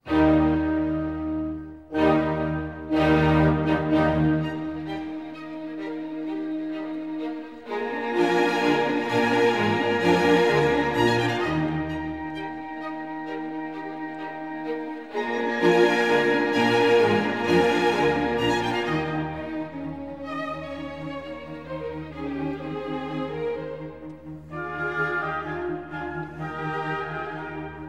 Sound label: Sinfonia Concertante For Violin and Viola in E-Flat Major, Allegro; Mozart; K. 364; I. Allegro Maestoso